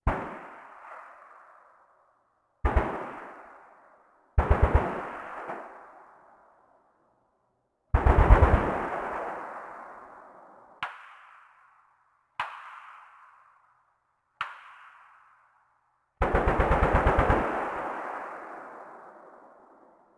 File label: automatic, combat atmosphere; World of Tanks Blitz